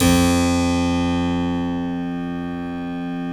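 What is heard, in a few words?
F1 clavinet left, Keyboards Acoustic; USB Soundscan vol.17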